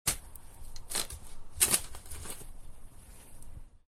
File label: shovel; ships; CosmicRage; comets